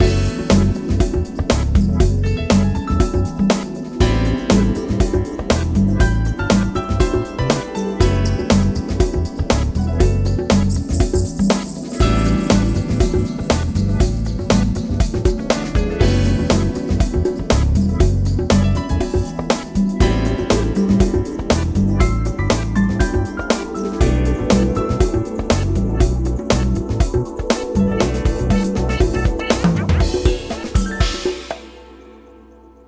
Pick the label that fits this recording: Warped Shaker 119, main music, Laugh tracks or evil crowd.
main music